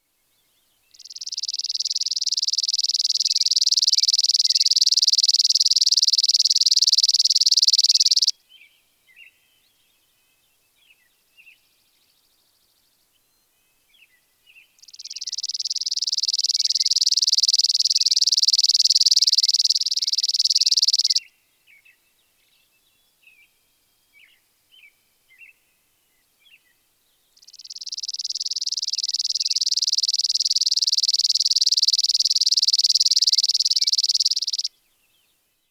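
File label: sounds of common savannah sparrow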